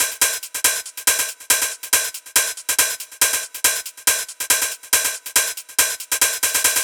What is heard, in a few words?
Loop 140 BPM; vengeance essential club sounds-3